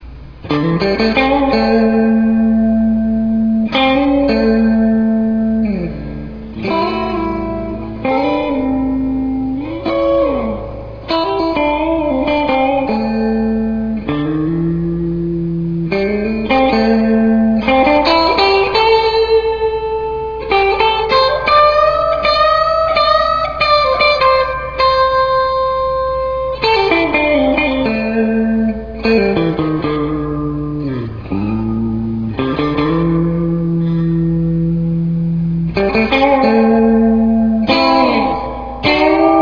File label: clean blues